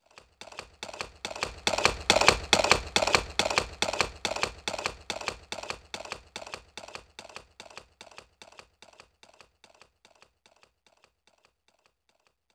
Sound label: Horse Gallop; Roland BK-5